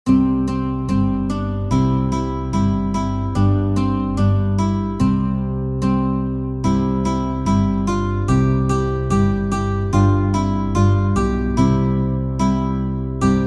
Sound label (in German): GTR Quartett